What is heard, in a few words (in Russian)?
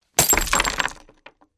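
разбивание бутылки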